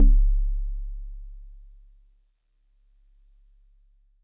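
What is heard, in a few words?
Celeste DX100 C#2; Pristine Celeste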